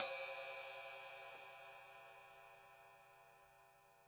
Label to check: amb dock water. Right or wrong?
Wrong